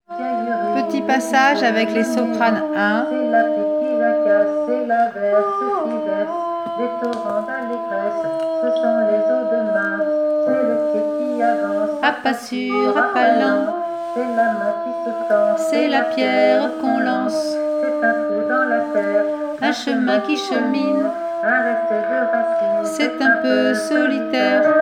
voix sop

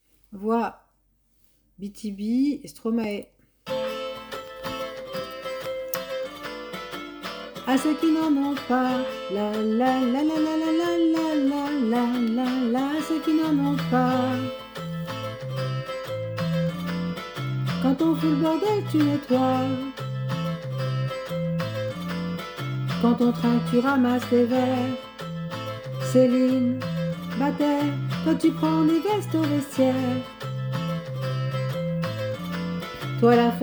voix

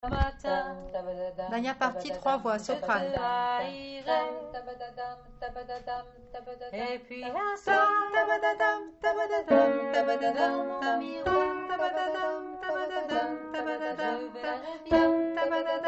3 voix

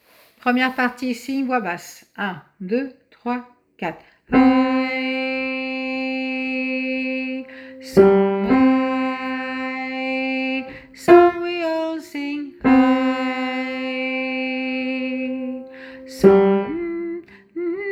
basse

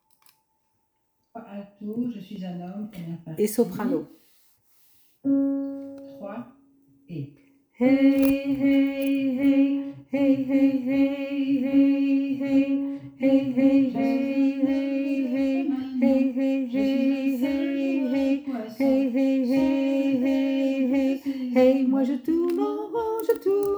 voix soprano alto en fond

